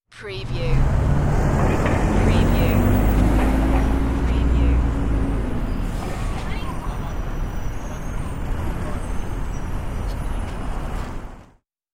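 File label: Bus Rev Pull Up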